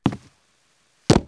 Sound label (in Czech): skok; Mafie 1